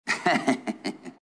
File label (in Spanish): risa; Stallone